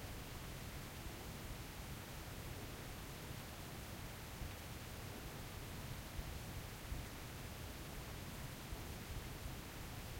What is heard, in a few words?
Fog Environment; Game rip; MiSide